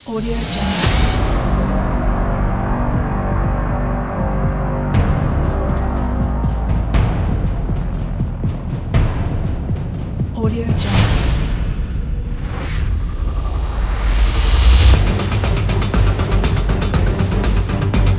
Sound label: high energy trailer